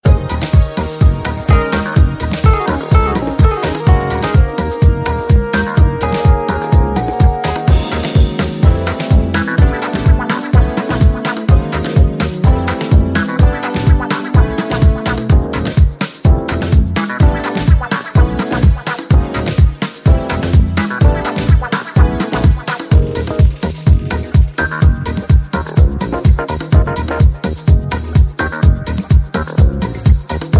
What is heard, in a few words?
Dirty Disco Jazz Funk